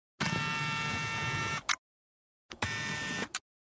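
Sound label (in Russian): zoom kamery, camera zoom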